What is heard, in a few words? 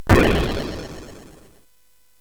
SFX; CSG8580R5; sid; Narco Police; hvsc